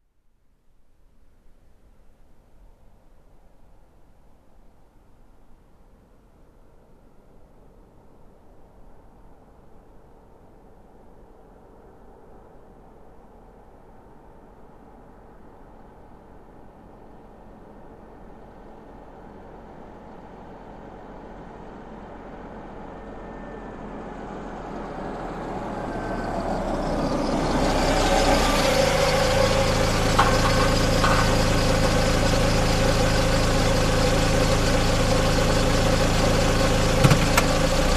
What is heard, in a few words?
Car parking engine shutoff